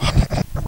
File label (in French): raclement bête